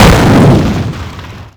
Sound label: small explosion; WAR